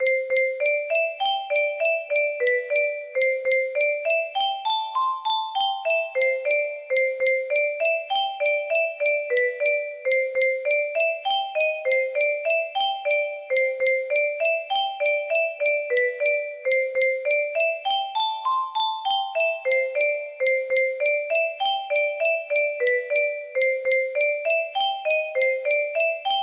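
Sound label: music box melody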